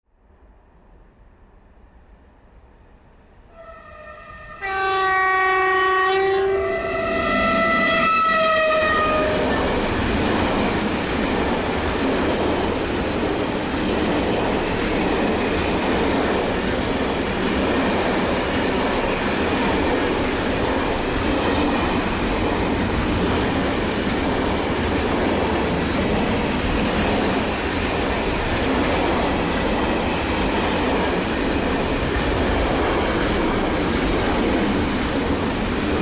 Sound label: claxon